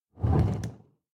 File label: Bowling Ball Move